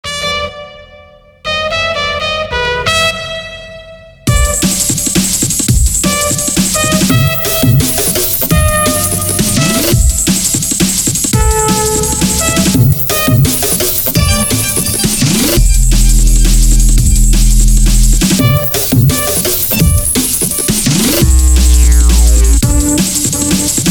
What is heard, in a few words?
Jazzy Bass